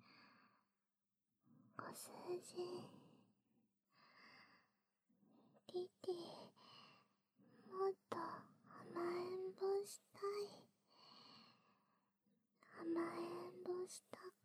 耳舐め,ASMR; 裸でリラックス耳舐め

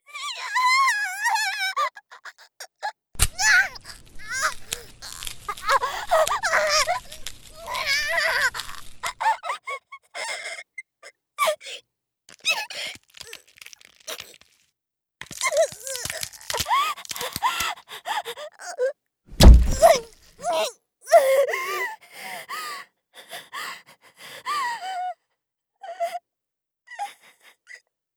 糸ノコギリで指カット

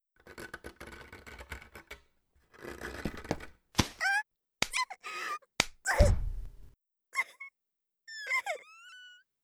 取り上げる; 効果音あり

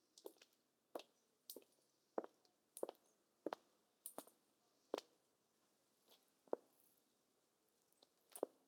ヒールの足音(正面へ); 足音系

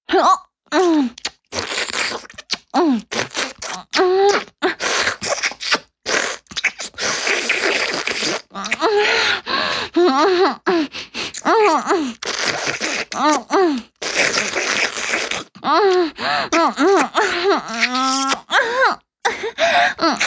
お掃除フェラ(中):亀頭を咥えて中に残った精液を吸い出す, チュパ音; チュパ音抜粋集

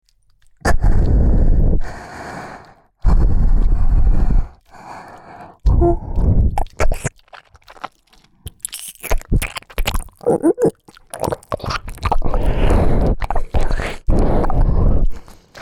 喘ぎ 吐息 混じり 耳舐め; 優しい年下JKに甘えた～い！童貞卒業委員会♪

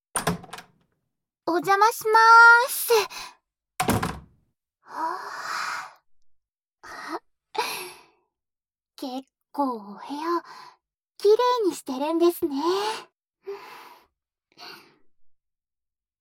心地いい音でリラックス睡眠導入 炭酸 風鈴 スライム 心音, ASMR

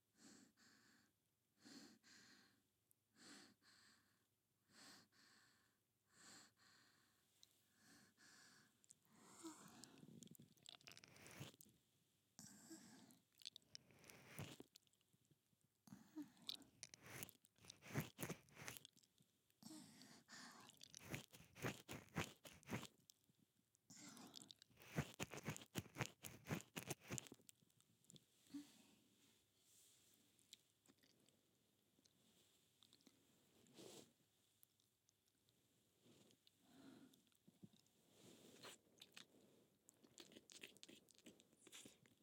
シスターの耳舐め（左）; シスターの耳舐め悪魔祓い

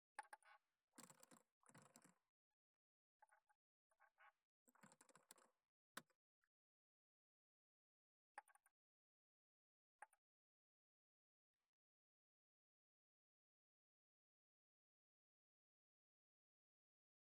彼氏との生交尾見せつけ手コキ, ASMR

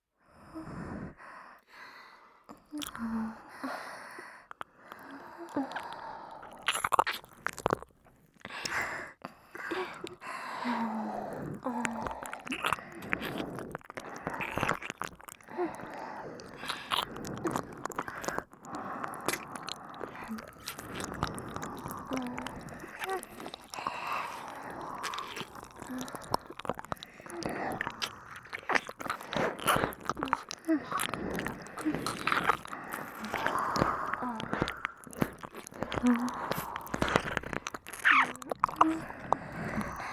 キス：両耳舐め; 中央＞春川先生

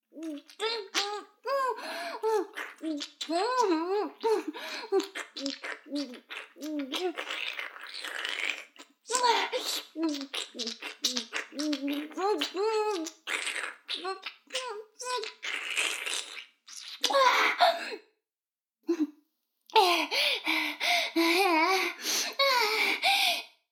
フェラ音声, ASMR; 逆時間停止されて

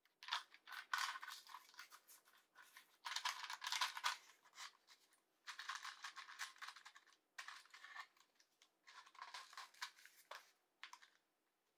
マッチで火を点ける

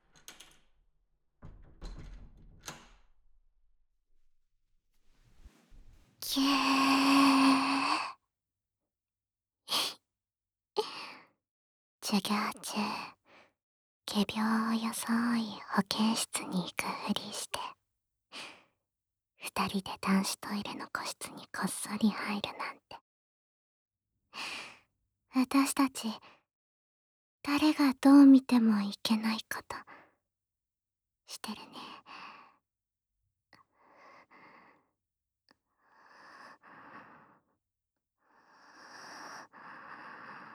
ディープキス 耳舐め; 休み時間の男子トイレにて; 対面座位

